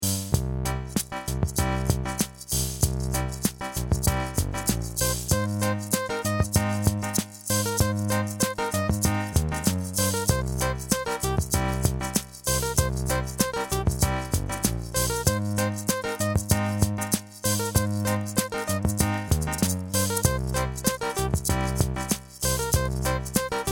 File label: Casio